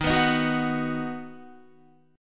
Eb Minor Sharp 5th, Guitar; 11,14,13,11,11,13; 4E,4A,5D,5G,5C,6F; strummed

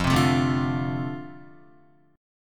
F 6th, Guitar; F6 strummed

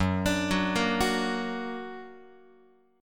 F sharp Augmented F sharp Guitar; F#+ arpeggiated

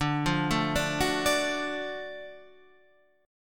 Gb Minor Major 7th Sharp 5th D, guitar

